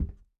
step shoes, footstep

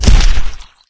crunch

